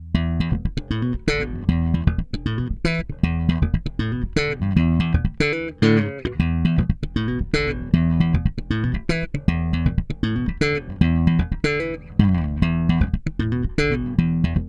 slap

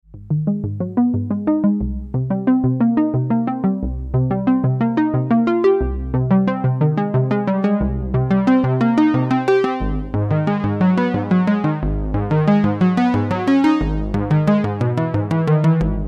jup4 pwm

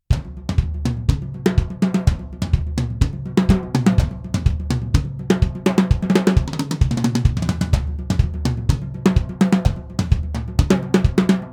Snares off

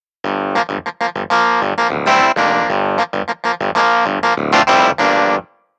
Roland Clav

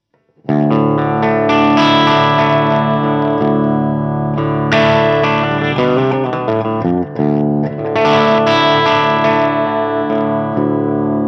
Sound City Master One Hundred Clean

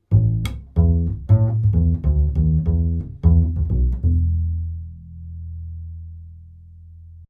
AKG414XLS Upright Bass 2 Meters

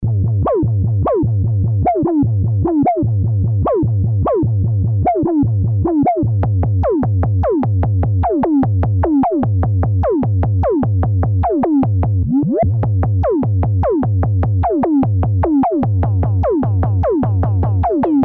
ms20m ws crazy percussion

